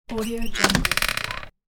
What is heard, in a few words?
door handle